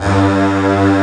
STRINGS G2; EdgeSounds; Drum Mashines VOL-1

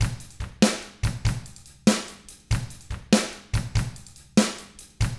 rock 6/8, drums; ILIO; Double Platinum Drums 1

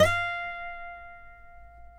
COLLINGS F 2, Guitars; Spectrasonics; Hans Zimmer Guitars VOL-2; FNGSTYLE PFM

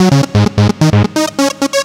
NRG Lead, trance

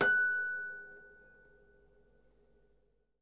H F#6, Percussion & Harp; Miroslav Vitous

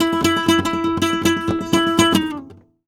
Remates De Pulgar, WAV Guitar, Flamenco

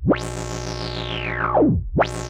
Odd Modular; radiosonics

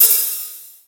OP HI HAT; Greytsounds VOL-1

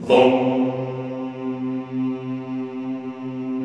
CHO C2, Vohmm Hohmm; Sound & Vision; Gigapack I CD 2

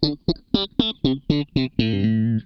FAZE PLUK, Funk Guitar; 90 s Sample CDs; Zero G

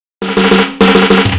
Laidback Fill, Drums; Fills; Misc Fills

